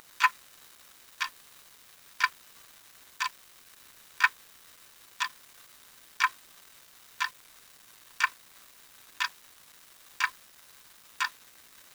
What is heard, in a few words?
tick tock; valve; dream